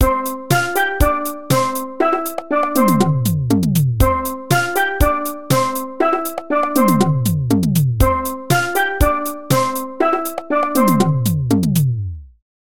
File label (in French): carillon